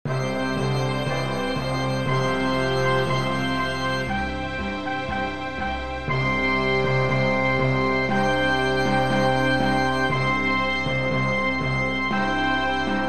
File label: Imperial Fanfare; Belariath; empire